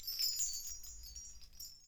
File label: BELLTREE